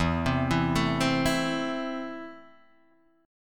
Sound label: E Suspended 4th Sharp 5th, Esus4#5 chord; arpeggiated; JGuitar